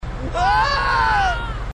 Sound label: marines screaming; dialogue